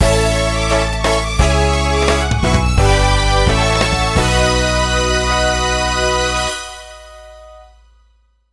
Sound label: Bowling - Perfect Game; Super Monkey Ball 2